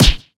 combo hit; HeavenStudio; karateman

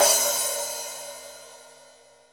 pose; HeavenStudio; the Dazzles

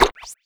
right Throw; HeavenStudio; love Lab

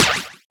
offbeat Object Out; HeavenStudio; karateman

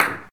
Serve Bounce, rhythm rally; HeavenStudio

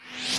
zoom fast, fork lifter; HeavenStudio

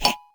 mole Heh; HeavenStudio; crop Stomp

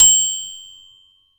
end ding; HeavenStudio; rhythm Test GBA

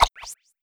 left throw; HeavenStudio; love Lab

